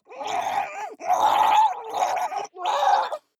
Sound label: water monster female gargle angry; comedy-cartoon; soundpool